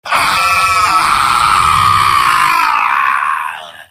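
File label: scout pain critical death; mvm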